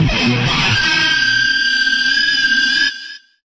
guitar scream

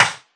CLAP, Volca; drums; hip hop; WallsVado Volca Sample Pack

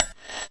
GATE MTL, Gated Reverse Slapback Kit; drums; STYLE KITS (Hip Hop, Etc)

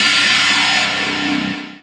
Electro FX; Ueb HE Electro FX

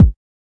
kick

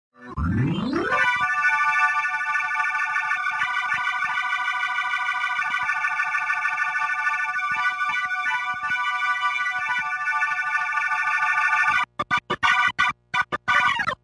organ

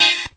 mild stab

